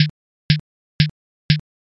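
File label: footsteps leather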